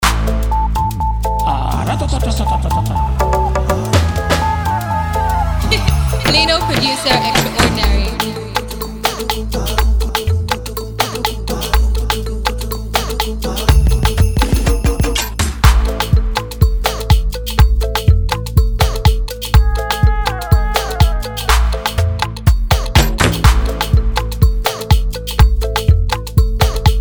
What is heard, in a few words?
Club Banger Beat